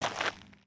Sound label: Foot Dirt Sneaker Walk, footsteps